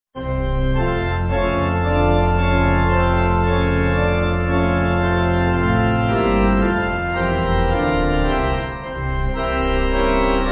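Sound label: Pipe organ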